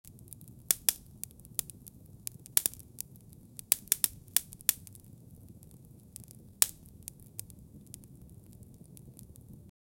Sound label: lighting camp fire